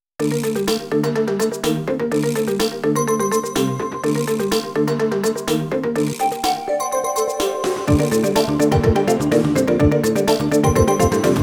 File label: Ethno; Ethno Windows